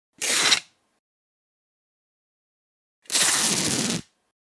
Sticky Tape, Sticky tape being pulled off roll.; Offices

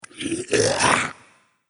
Beast Monster; horror; Andrew Fleming

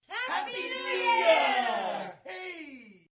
Group New Year Hey, Cheering, group; Party Sound Effects

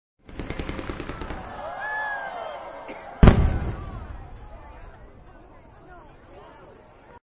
Mssve Fr Wrk, Fireworks with crowd sounds.; Mulitple Fireworks; Firework Sound Effects; Sound Effects:Backgrounds

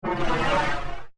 Android Aircraft, robot; Robot Sound Effects; science fiction sound effect

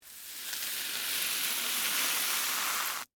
Scrape Cereal, Corn, Flakes; Texture; Scrapes (Sound Effects:Objects); BlastWave

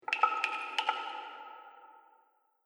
Water Fantasy Cave Drips; Dripping Sound Effects; Agcnf Media

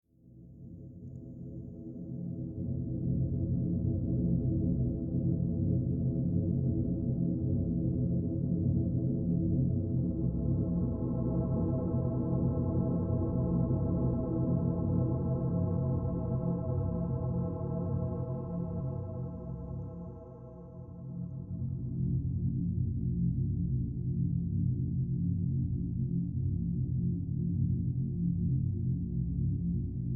Atmos Fantasy Drone Moving; Sound Design; Sci-Fi; Big Room Sound

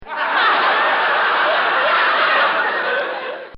Laugh Track; Human

